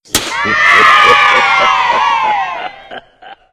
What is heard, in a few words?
clown sound